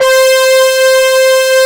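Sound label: PWM brass C4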